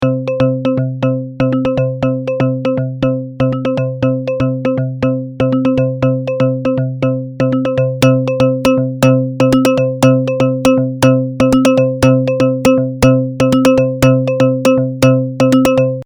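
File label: dual arpeggio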